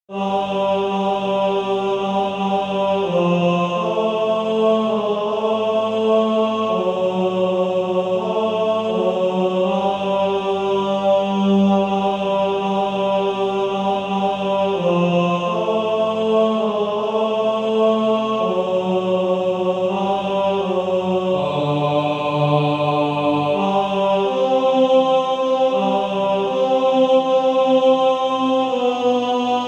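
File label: Male Choir, tenor 1; TTBB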